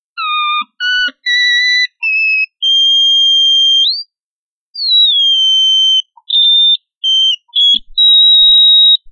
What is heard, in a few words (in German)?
US-Detektor Sample US-RC